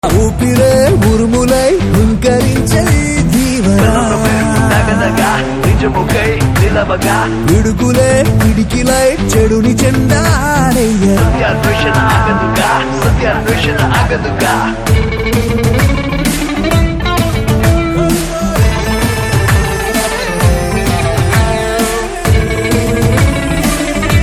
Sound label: Telugu Ringtone